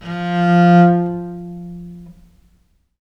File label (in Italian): F2, Historical Instruments, VIOLE MUT; USB Soundscan vol.23; AKAI